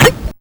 YM Vox Glitch; ChipShop; CHIPSHOP DELUXE; CHIPSHOP HITS

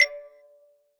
Tech Drum Hit, techno 120bpm

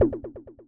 Perc, techno drum

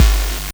GB Kick, DRUMS; KICKS; ChipShop Deluxe; ChipShop Hits

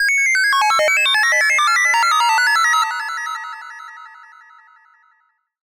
Synth Noise 85, hiphop 85bpm; musicradar

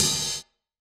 DnB Drum Hit Ride; drumnbass 170bpm; Drum Kits; musicradar; Drum Hits A

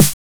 Snare, techno drum

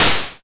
Snare, YM, CHIPSHOP HITS DRUMS; ChipShop Deluxe

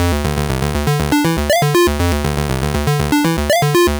120 Synth Dm, 120 BPM; CHIPSHOP DELUXE; CHIPSHOP LOOPS

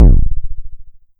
Vermona Kick

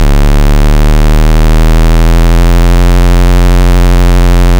YM Droner; SFX; ChipShop Deluxe; ChipShop Hits

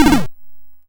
YM Triple Shot; SFX; CHIPSHOP DELUXE; CHIPSHOP HITS

